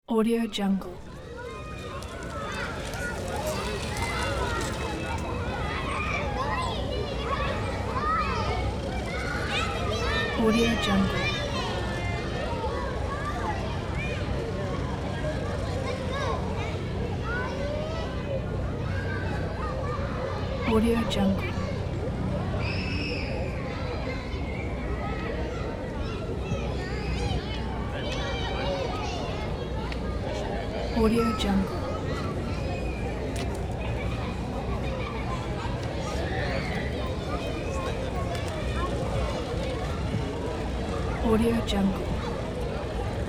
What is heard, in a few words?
children playing in the park